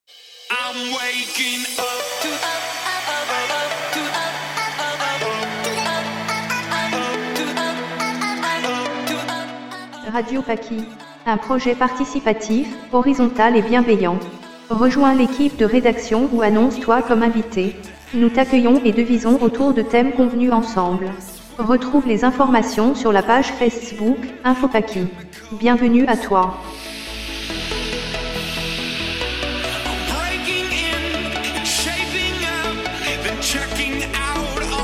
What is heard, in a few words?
Jingle